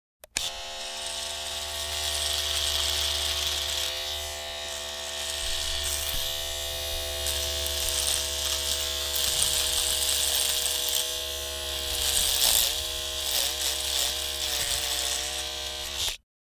Electric Shaver Turn on Shave & Turn Off, Household